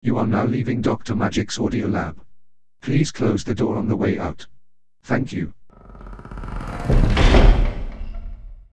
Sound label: Closing Jingle; Dr. Magic's Audio Lab Outro